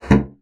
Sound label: bassoon slap; Xsample VOL-6; C BASSOON